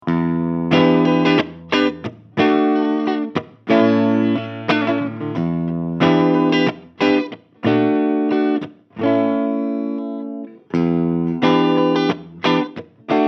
Clean; ESP LTD KH V Metallic Gold

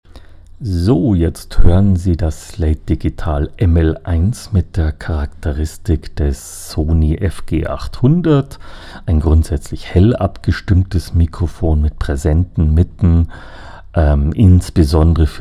Slate Apollo Gain Intense

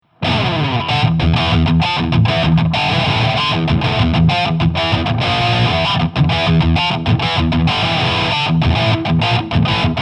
High Gain; Joyo Bantamp Zombie II

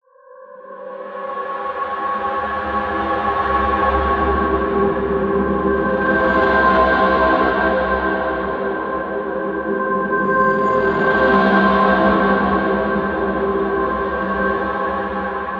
Nordic Spheres Distant Lights; SonuScore

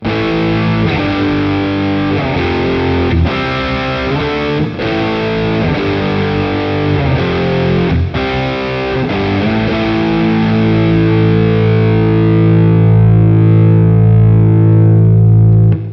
Brown Lead; Boss Katana Go

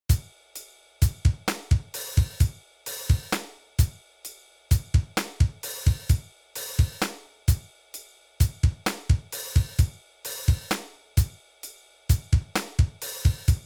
Funk; MDrummer

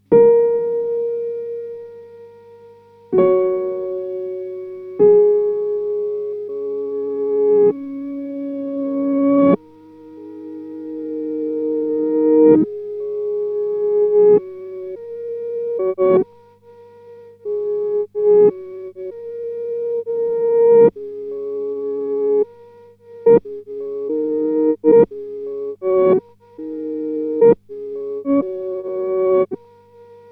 Syth Sound; Chase Bliss Blooper

